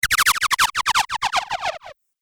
Arcade Game Skid; Video Game Sound Effects; Retro Inspired Sound Effects - Think Nintendo, Atari, C64; Andrew Fleming; Twistedtracks

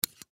Needle Remove Cap; Surgeon Surgery Four Inch Disposable Bone Marrow Biopsy Aspiration; Hospital; Emergency

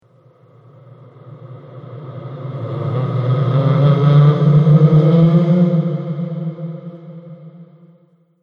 Haunting Halloween Spirit, ghost

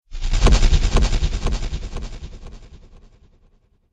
Cinematic Hit, Trailer Sound Effects

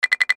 Error Tone Chime Wood Block Multimedia; Technology; Web Interactive; Chimes; Blastwave

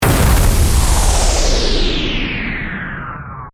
Hit To Whoosh; Hit Sound Effects

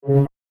Laser Beam Pulse; Laser Sound Sound Effects; science fiction sound effect; Andrew Fleming

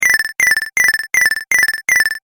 Retro Game Repeating Sound; Video Game Sound Effects

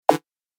Retro Gaming Sound; Video Game Sound Effects

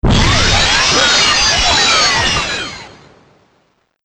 Exploding Robot, explosion sound effect; Robot Sound Effects; Bombastic Booms And Battle Ambience

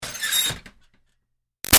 Ironing Board Metal Fold Out Screech

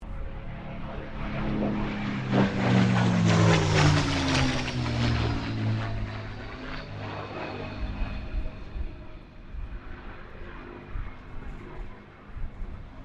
AIRCRAFT CAC CA-18 MUSTANG MK23 PASS WINDY; CA-18 /P-51 Mustang; Airplanes

